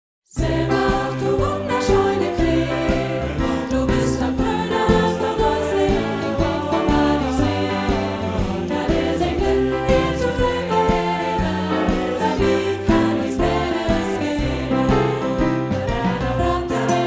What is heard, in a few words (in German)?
Gemischter Chor Instr